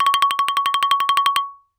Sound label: Xylophone hard rubber roll ff Db6 stereo; MIS Pitches - 2014; Percussion